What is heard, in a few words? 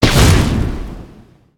flamewave; smite; Apollo